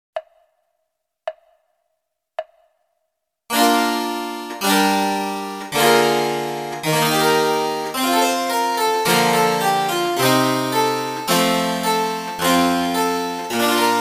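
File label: flute sonata BWV 1030, oboe; bach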